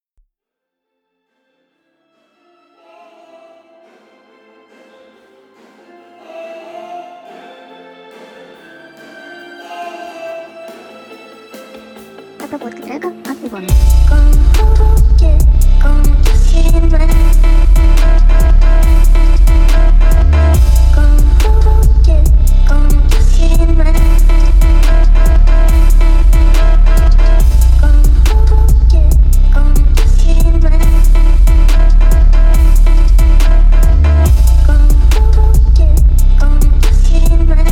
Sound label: bass